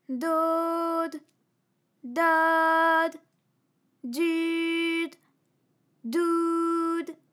dodaududoud, vocal library

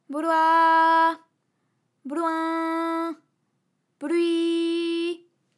bloi bloin blui, vocal library

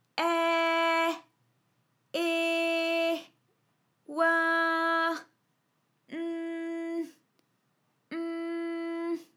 aihh ehhh oinhh nhh mhh, vocal library

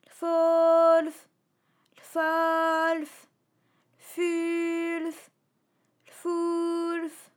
lfolfaulfulfoulf, vocal library

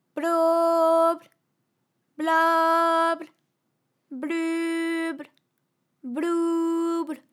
bloblaublubloubl, vocal library

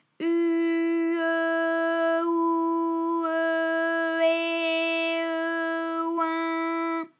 u e ou e eh e oin, vocal library

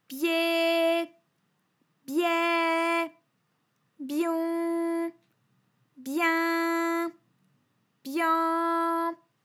byeh byai byon byin byan, vocal library

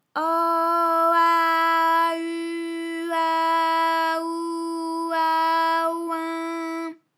au a u a ou a oin, vocal library

